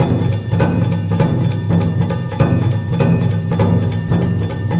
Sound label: japanophile, 090 bpm, drum loop